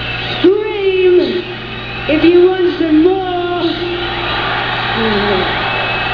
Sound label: scream